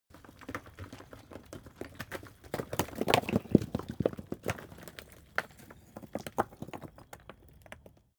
stone large fall long; natur-tiere; steine

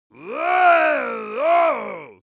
monster male growl aggressive; kreaturen

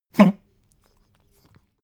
pug gag smack medium; natur-tiere; hunde

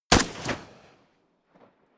rifle ak-47 exterior fire single close; comedy-cartoon; Pistols and guns; pistolen-gewehre

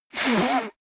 nose blow

